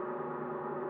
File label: reel general; netent